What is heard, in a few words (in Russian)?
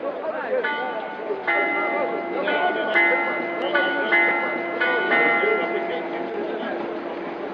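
колокольный звон среди толпы людей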